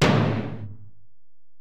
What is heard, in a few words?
Tom, Alesis DM-PRO